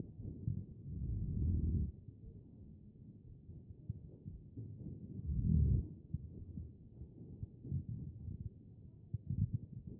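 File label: anterior chest, position 4; B MODE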